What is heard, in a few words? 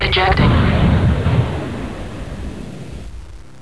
ejecting; MECH2